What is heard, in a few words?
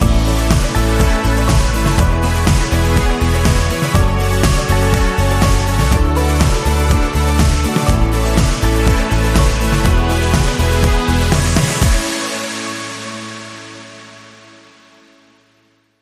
Bumper